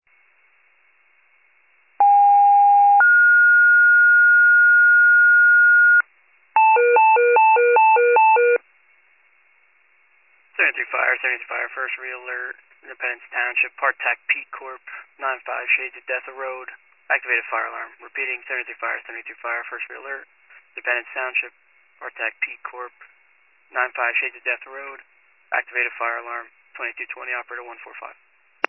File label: fire, pager alert